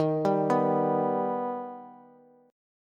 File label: Eb Augmented, Bass; arpeggiated